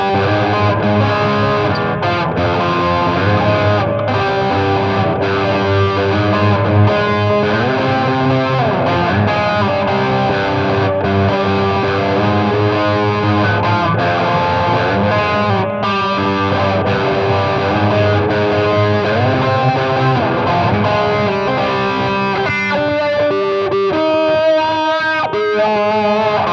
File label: guitar only